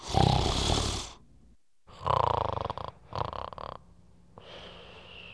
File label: Snore; ScummVM; Simon the Sorcerer Puzzle Pack (CD Windows)